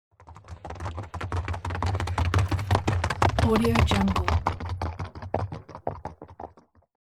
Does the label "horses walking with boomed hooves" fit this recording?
Yes